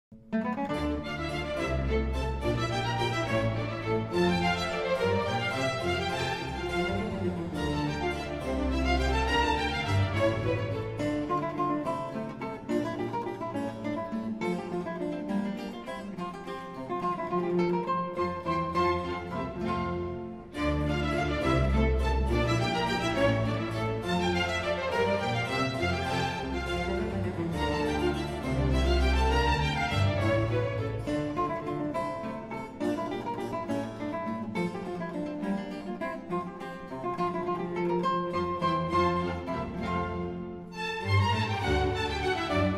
Concerto in D minor for guitar and string orchestra; Baroque guitar concertos; Baroque Guitar Concertos- Vivaldi, Handel, Weiss, Fasch